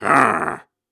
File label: Snarls and Hums, Viking Vocal